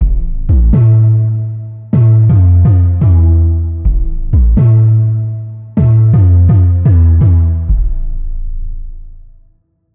Cinema Thump, bass synth; Wave Alchemy - Bassynth 1.1.0

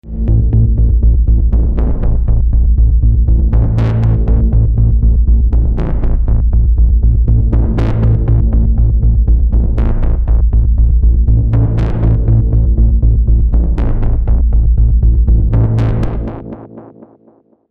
Pulsed

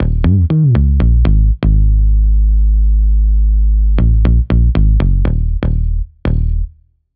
Gliding Fret, bass synth; Preset: Gliding Fret; Wave Alchemy - Bassynth 1.1.0

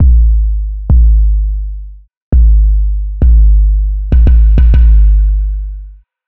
Mallet Boom, bass synth; Wave Alchemy - Bassynth 1.1.0

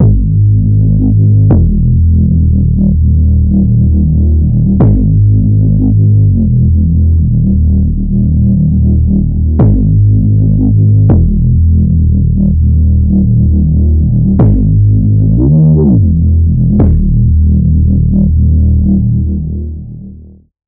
Boom n Glide, bass synth; Wave Alchemy - Bassynth 1.1.0

